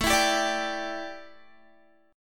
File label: A 7th Suspended 4th, A, down, Guitar